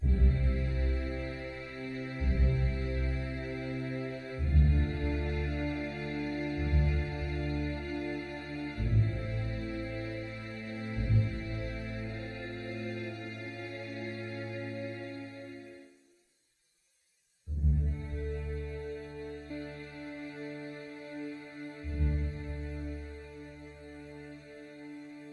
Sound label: Busy Streets (City of Tortage Strings); Age of Conan Hyborian Adventures